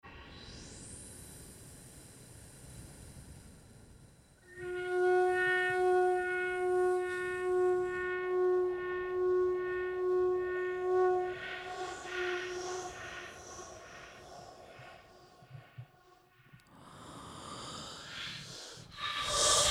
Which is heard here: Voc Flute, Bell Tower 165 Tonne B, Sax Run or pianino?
Voc Flute